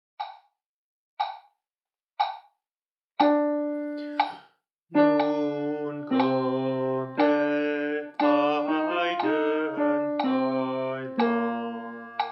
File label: nun komm der heiden heiland alto